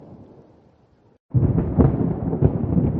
thunder crack; Horror

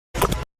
teleport whoosh; Sci-Fi

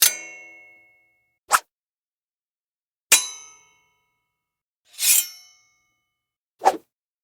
sword hits scrapes woosh; DailySounds

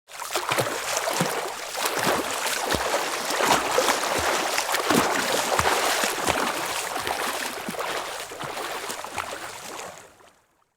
swimming stroke loud

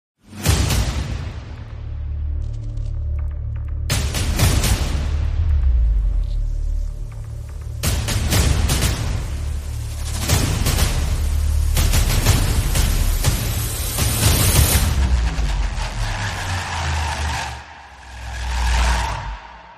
epic drums; Music

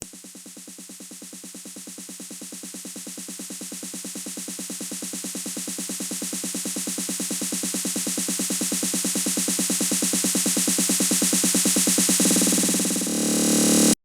techno snare roll glitched drop; SFX; DailySounds

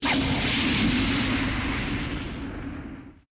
acid magic spell cast

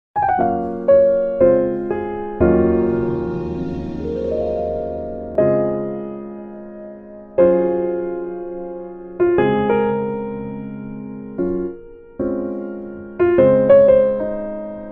sad piano outro; Music

